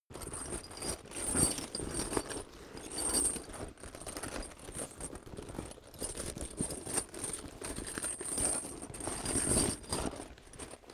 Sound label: metal backpack parts rattle